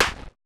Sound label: perc, Roland MC-909